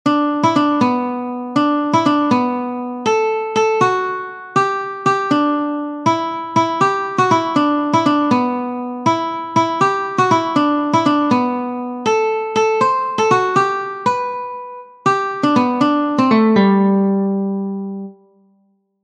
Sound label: Silent Night Guitar